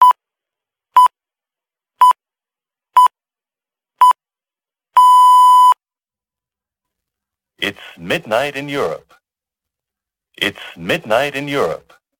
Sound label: Voices, Midnight Call from Shortwave